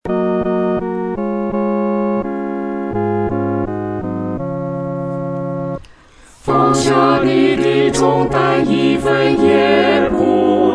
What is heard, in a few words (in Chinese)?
合唱 四声部